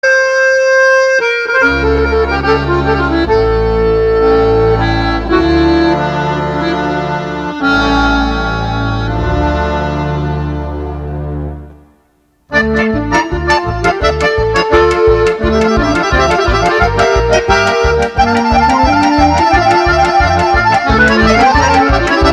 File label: Czardas